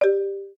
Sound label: Pipes, notifications; lineage-20.0